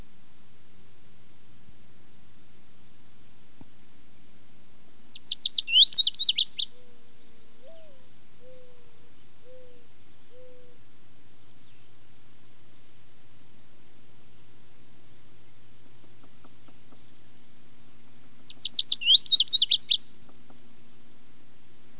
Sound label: lazuli bunting; bird songs of WA; calls